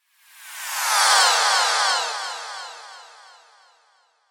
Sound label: Space Whoosh Descend, Scifi, Space, Whoosh, Descend, Delay, Science, Fiction; BlastWave